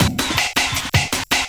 Drum & Bass, 160 BPM

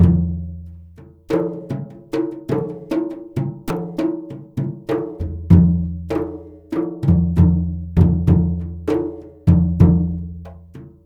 MLY DRMS; Spectrasonics - Heart of Asia CD2

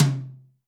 tom, Zoom MRT-3B

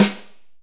SN 3 MF, Ensoniq ZR-76

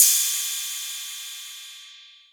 808 Cymbal; Novation DrumstationV1

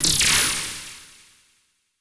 CABL TEN, CABL TENS; Total Control - Action Cutz

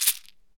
SHEKE, Afro Shakers, Shekeres

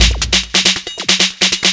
drum 138, drum-loops, 2bass, Techno

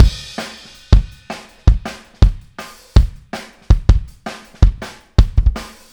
Kick, 162 BPM; Verse; Drums

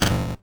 Bent Tom; glitch; Bent Hits

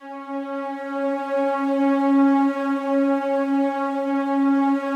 slow strings C#3, Yamaha VP-1; 90 s Sample CDs; Music Store

